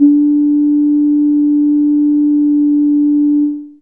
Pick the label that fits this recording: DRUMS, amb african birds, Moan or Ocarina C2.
Ocarina C2